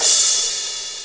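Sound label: cymbal splash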